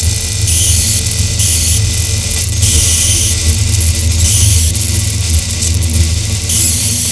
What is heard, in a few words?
electric; cstrike; de japan